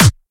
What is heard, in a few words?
kick